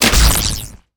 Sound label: Chain Lightning Impact; Evolve; Elder Kraken